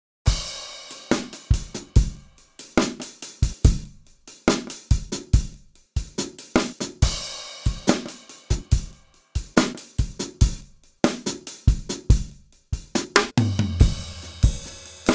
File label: Pop Drums; Realsamples